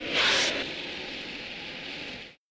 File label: snake